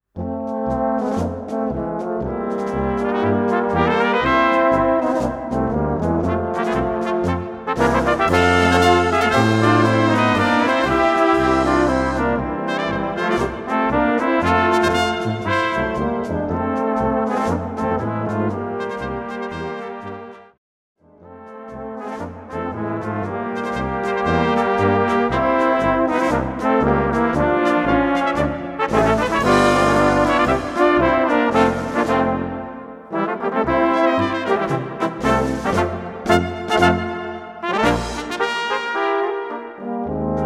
Polka

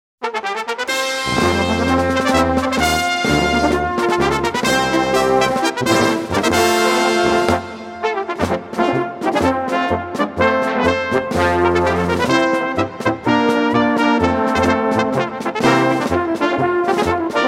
Marsch

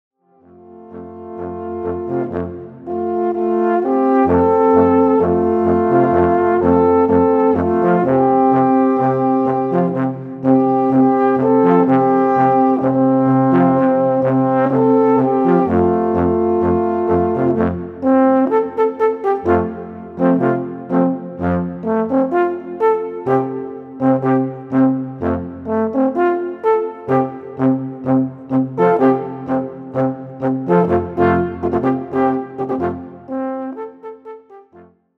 Tango

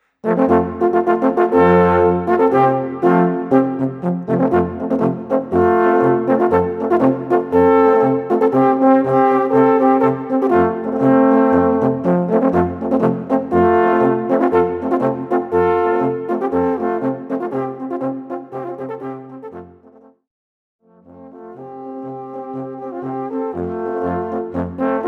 s´Alphorn Märschle

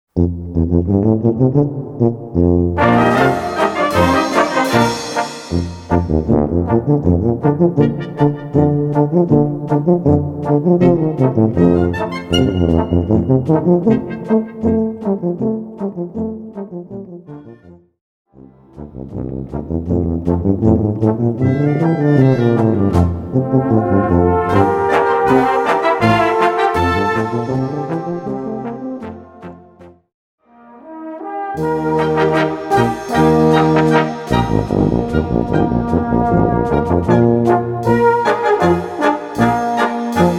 Tuba

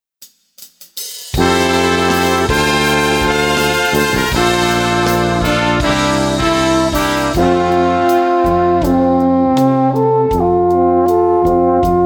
Alphorn Ballade

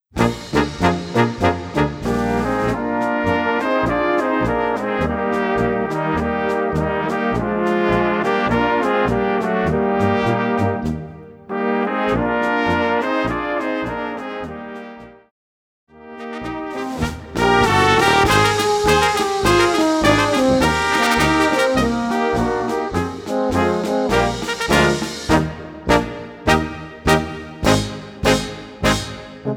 Polka

